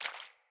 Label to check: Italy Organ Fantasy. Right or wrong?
Wrong